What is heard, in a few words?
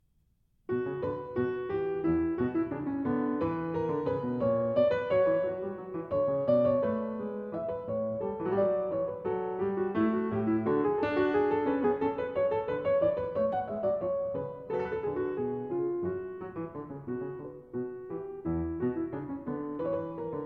French Overture In B Minor